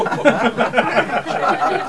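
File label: LAUGHERS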